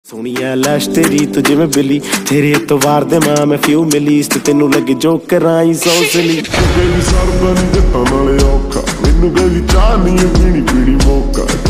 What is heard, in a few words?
slowed reverb rap ringtone